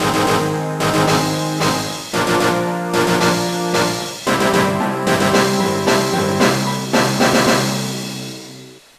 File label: Area Completed; Alien Hominid; GBA